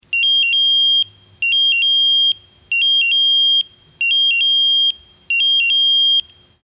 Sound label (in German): Pieper